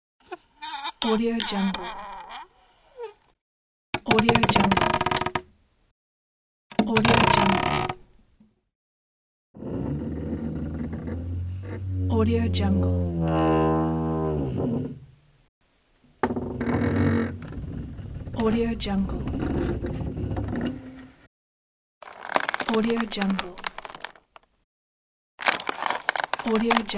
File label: creaking boards; palmirasoundstudio